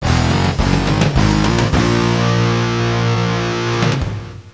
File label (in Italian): suonerie musica rock